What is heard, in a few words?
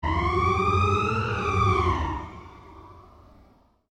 Created Cyber Whale Call, Alien Sound Effects